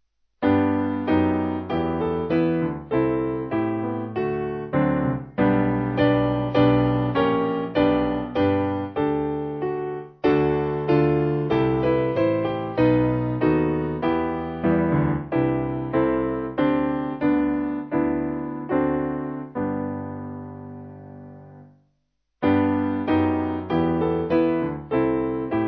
Piano; Love Divine; Stainer

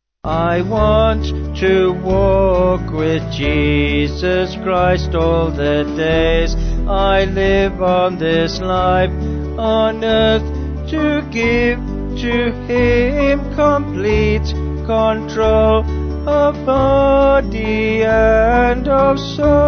I Want To Walk Vocals Band; Swiss

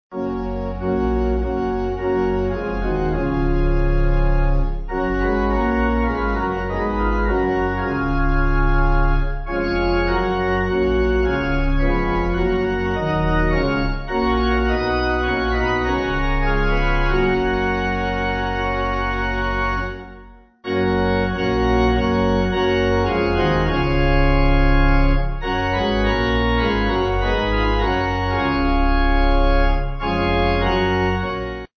Pipe

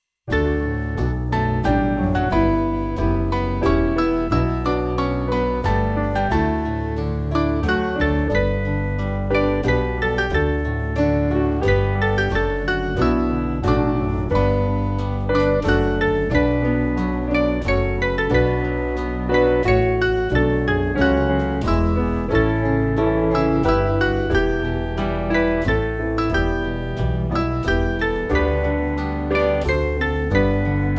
Lord May I See, Jerusalem, Piano

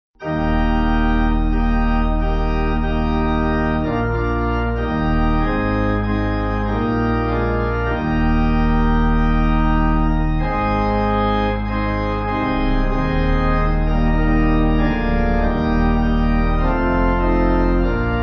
Pipe; Come To The Saviour Now

